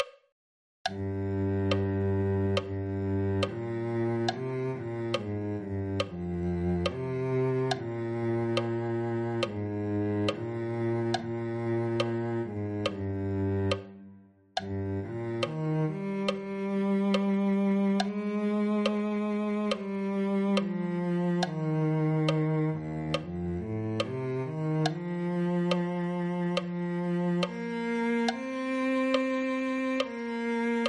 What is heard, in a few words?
cello